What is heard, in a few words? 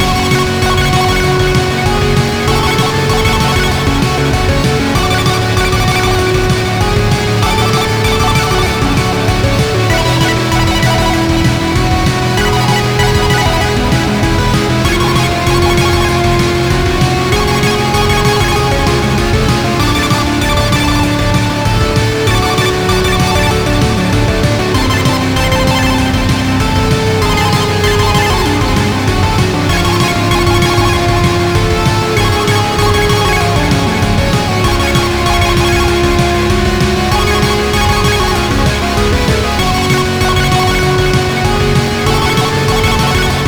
Punk Chiptune; virtuaverse ost 2020